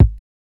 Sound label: Kick